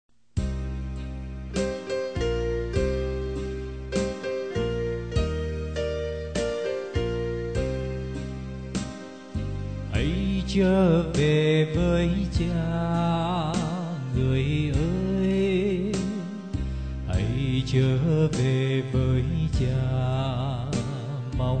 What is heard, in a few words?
BASS